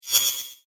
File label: Magical Metal Impulse; Magic Sound Effects; fantasy sound effect